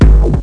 hardcore-fx+echo, bdrum; bdrum samples

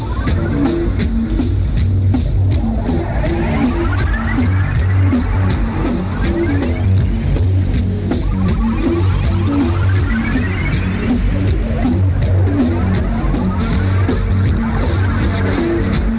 space song

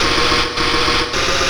D+B 160; eJay

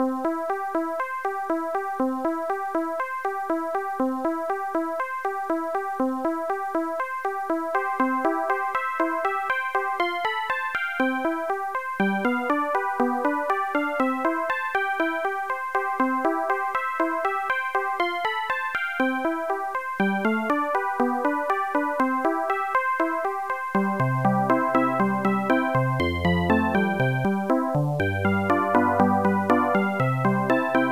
MUSICBOX; Screen Thief 1.06; cinema; Creative Music Format

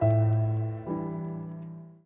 New Mail Sound

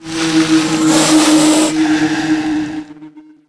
hammerhead die; DAoC

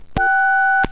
tone; Sound Effects Library 1.5

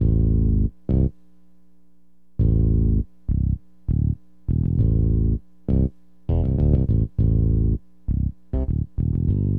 BASS, HipHop

